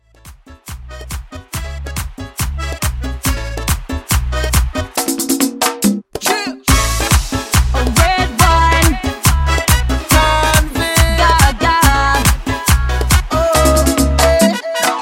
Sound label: Dance Mashup 140